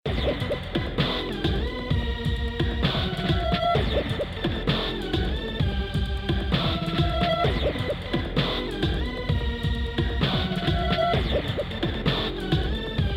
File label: Dubstep Radio; Luigi's Mansion 3; E.Gadd Selection